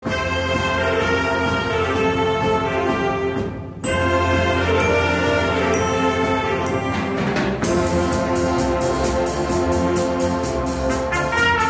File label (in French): Instrumental